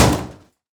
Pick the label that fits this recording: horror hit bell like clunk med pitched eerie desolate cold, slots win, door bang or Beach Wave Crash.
door bang